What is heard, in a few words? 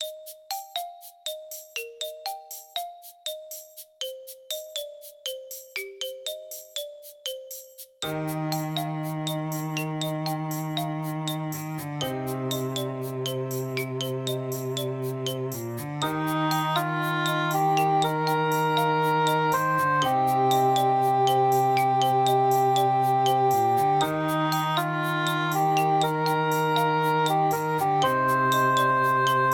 town cheery